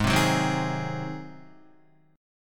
Ab 7th Ab Guitar 4F,4A,5C,5G,5C,6E; Ab7 strummed; JGuitar

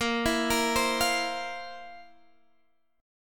F Major 7th Suspended 4th Bb

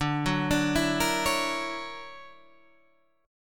D Augmented Major 9th down Guitar Half Step Down

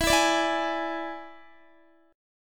E 7th E down Guitar; strummed

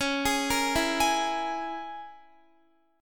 Db Minor 6th Db down Guitar; arpeggiated

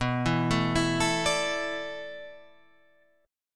A Suspended 2nd Suspended 4th

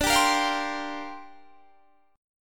Bb 6th Add 9th D

